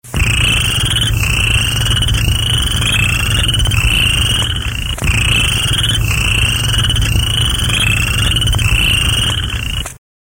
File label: chorus, fish and wildlife